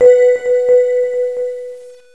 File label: gemstring; jazz jackrabbit 2